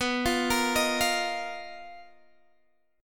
B Major 7th Flat 5th B Guitar Open G

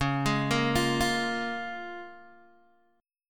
Db 6th, Guitar, Open G

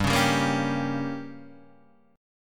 G Diminished, Guitar, Open D

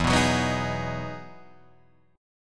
E Major 9th E down Guitar Drop D

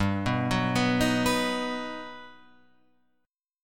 G Augmented Major 7th, Guitar, Open F; G+M7 arpeggiated; JGuitar

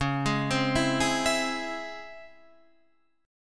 Db Minor Major 7th, Guitar Standard chord; DbmM7 arpeggiated; JGuitar

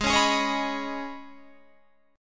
Db 7th Suspended 2nd

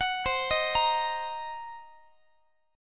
C Minor 7th Flat 5th Guitar, chord; Cm7b5; arpeggiated

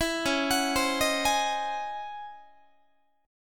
Db-Minor Major 11th Guitar, DbmM11 chord; arpeggiated; JGuitar

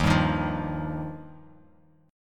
D sharp Major Add 9th D sharp, chord; D#add9 strummed; 11,10,13,10; JGuitar

